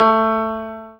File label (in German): ZIMBAL A 2; Best Service; Ethnic Journey Sound Cube